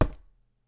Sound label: Cardboard Hit